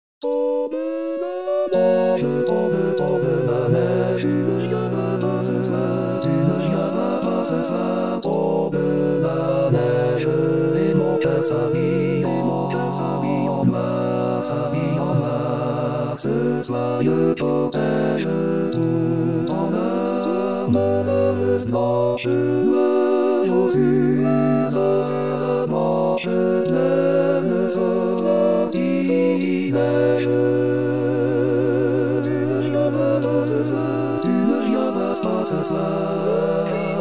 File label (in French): Tutti; Variété